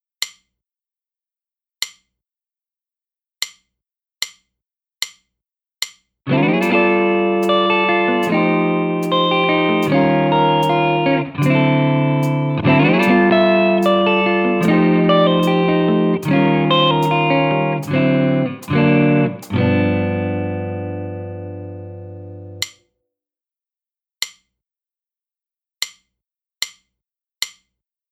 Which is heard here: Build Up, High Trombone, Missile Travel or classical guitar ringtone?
Build Up